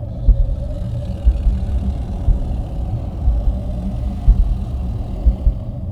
wraith idle; Stellaris; guardians

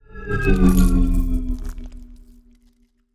ship construction move; ships; biogenesis

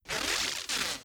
reality breach spark; Stellaris; nemesis

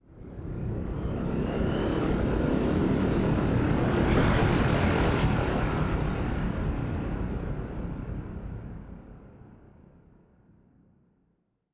ship cruiser move; Stellaris; machineage

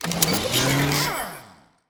ship designer add utility; Stellaris

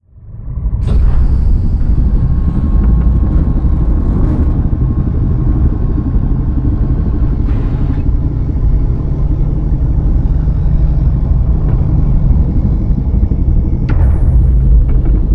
mammalian colossus opening; apocalypse

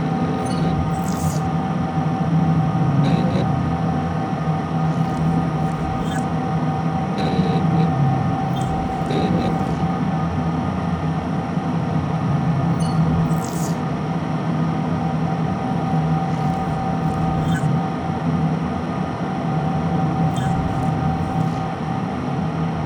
civilship, ambient; Stellaris

